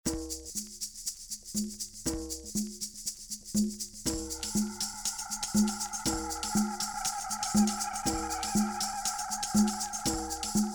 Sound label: Flute Version; aMAZEing Hamster; Videogame Music Collection by Deer Lover